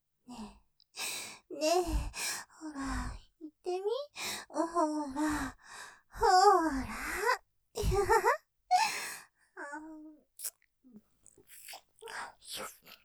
舐め◆煽るように・ほらぁいってみｗｗふふｗあははｗ; ステレオ

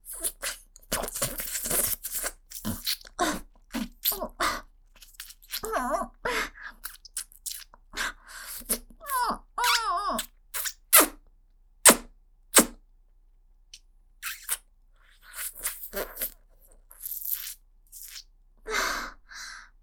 舐め屋 脱力系→吸い付きフィニッシュ, ASMR; ゆるふわ羊のパティシエさん; JKうさぎっこ 首輪ちゃんのわけありや 初接客

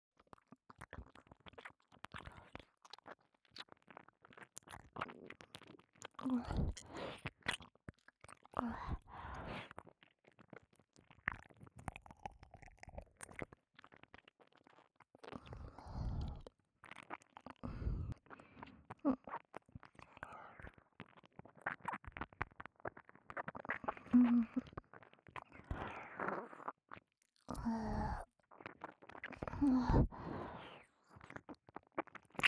耳舐め 右; 千里

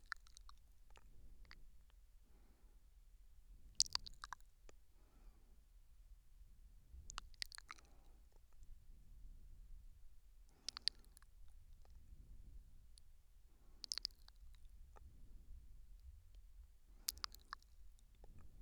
右耳甘噛み

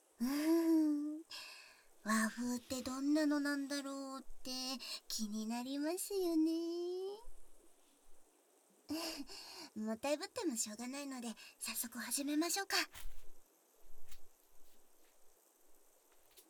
あずきマッサージ

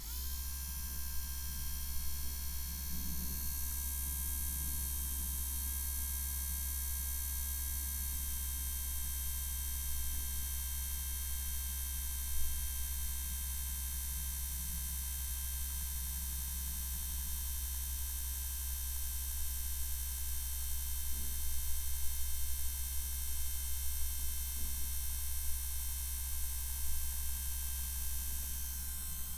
電マ音

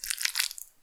膣外射精

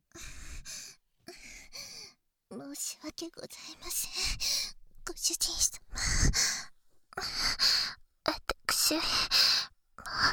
片耳触手攻め, バイノーラルマイク オマケ効果音; 触手耳攻め; セックス

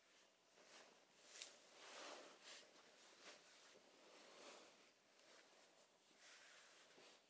衣擦れ背後から左へ

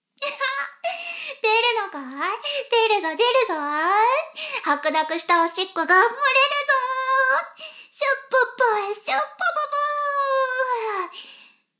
しこしこ音声; 牧場戦腺; 少佐殿

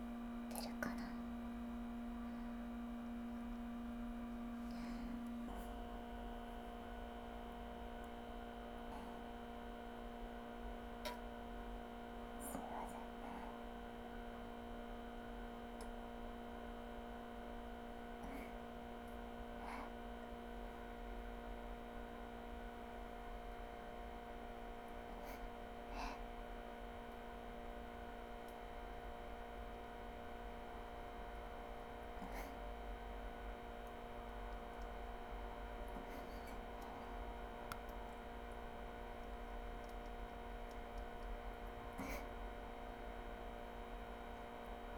大実況 小さめ ガス音 ミチ音 ポチャ音, ASMR

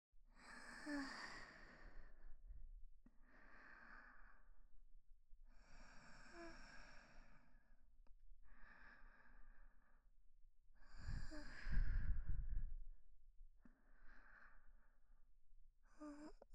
寝息・彼氏と一緒に穏やかに眠っている彼女; ストレートお姉さん系カノジョ

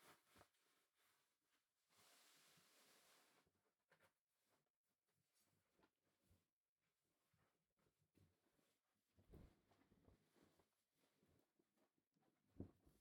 タイトスカートを脱ぐ; KU100; 色々な動作

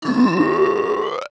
魔物 ダメージ1

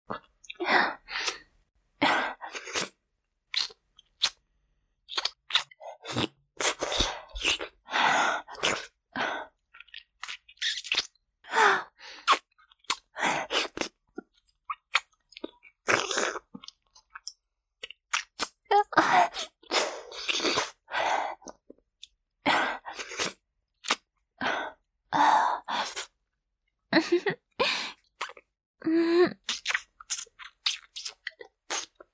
お掃除フェラ(弱):陰茎や玉袋に垂れた精液を舐める・吸う; 実用ボイス抜粋集

